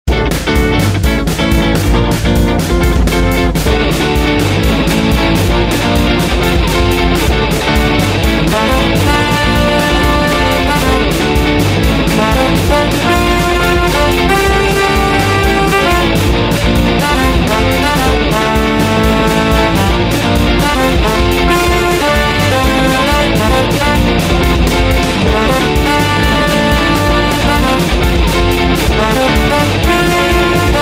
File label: Ska